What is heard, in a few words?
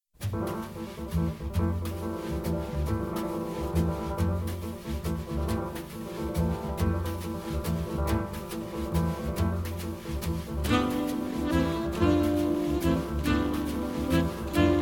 fade